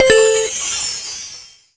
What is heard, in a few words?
checkpoint; sonic